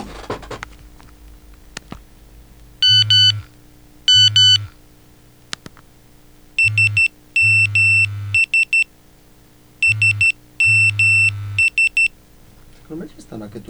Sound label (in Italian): suono iniziale suoneria SMS